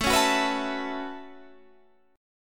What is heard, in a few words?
A 6th Add 9th A down Guitar Standard; A6add9; strummed